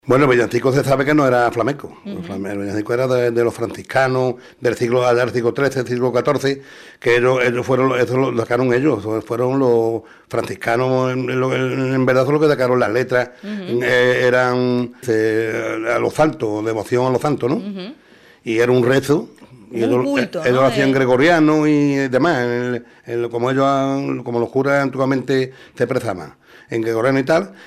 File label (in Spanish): VILLANCICO FLAMENCO